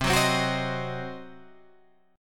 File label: C Diminished C, Guitar Open G